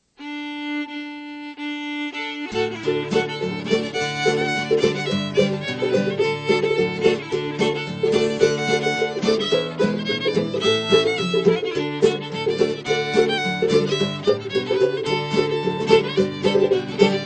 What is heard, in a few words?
Banjo